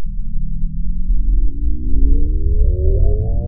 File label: activate; CosmicRage; CosmicRageSounds; nebula